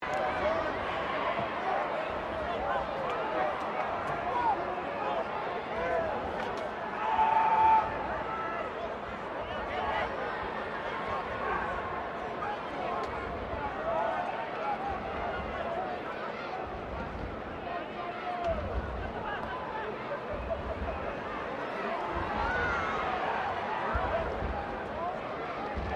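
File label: crowd 15K Rugby World Cup ambience low loop, Rugby world cup game; Crowd Sound Effects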